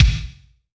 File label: BD, BASS DRUMS; EdgeSounds